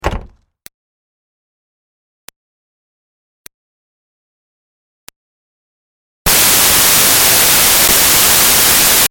Car Smart Car Door Close Lite; 2005 Smartcar Fortwo; Sound Effects:Vehicles; Big Room Sound

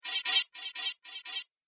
Droid Chirpy Bleeps, robot; Sci-Fi Robot Sfx; Robot Sound Effects; Andrew Fleming

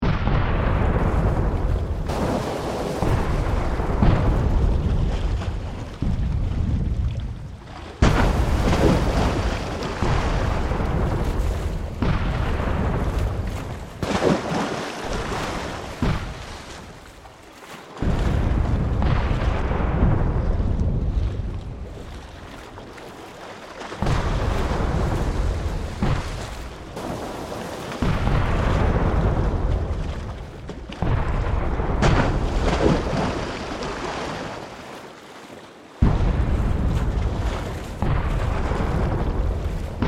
Battle Ships, Ambience, Warfare, Cannon, Distant; Bombs; BlastWave

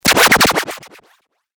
Alien Kung Fu Attack; Alien Sound Effects; science fiction sound effect; Andrew Fleming

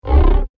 Lunar Lion, monster sound effect; science fiction sound effect; star wars / trek style games

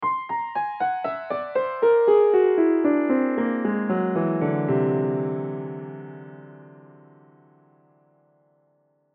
Piano Descend, Piano Descend Slow Mysterious; Musical; Pianos; Blastwave; Sound Effects:Musical

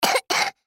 Human Cough; Cough Male Two Yrs Boy; Human Cough Male Two Year Old Boy; Sick; Sound Effects:Human

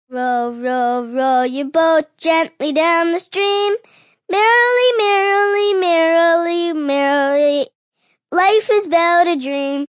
Row Row Your Boat, Singing, Male, child; VoiceBox

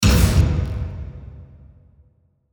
Hit Electrical Zap Dark; Electrical Button; Blastwave

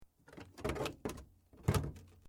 Metal Light Movement Minor; Materials

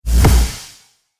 Trailer Hit Space Smash; Trailer Sound Effects

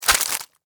Insect Eat Attack, Juicy, Wet, Bite, Bug; Animal, Hard, Crunch

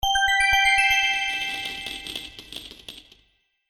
Bonus Gold Coins, video game

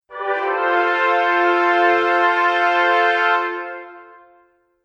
Short Epic Fanfare; Musical

